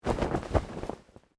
Clothing Shirt Cotton Move

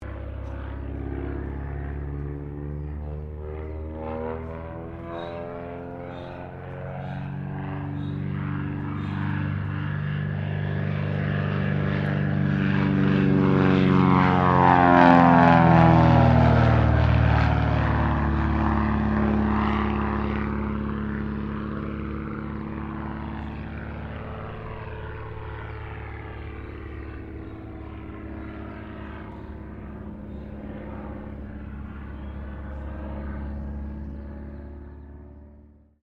AIRCRAFT CESSNA O-1 BIRDDOG PASS; Cessna Birddog spotter aircraft; Airplane Sound Effects; Airplanes (Sound Effects:Vehicles)

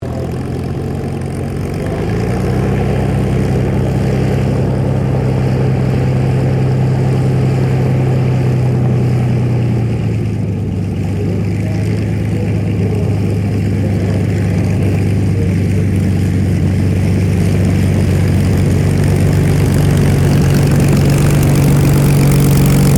AIRCRAFT SUPERMARINE SPITFIRE MK-VIII TAXI STEREO; Airplanes

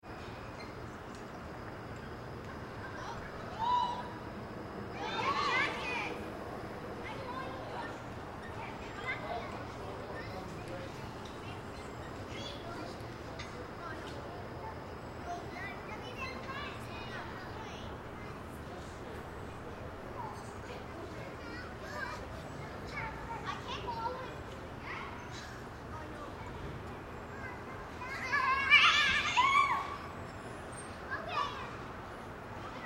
Crowd Kids Play Co-Op Park Light; Crowds

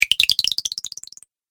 Magic Tokens Game Fx; Video Game Sound Effects

